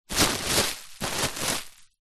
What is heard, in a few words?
plastic bag bin liner full of soft clothes or pillows push pressure apply; zapsplat; foley